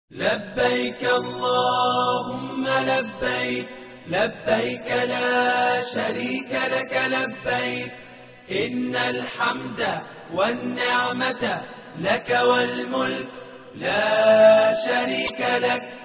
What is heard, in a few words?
Labaik Allah Humma Labbaik, Islamic Hajj Ringtone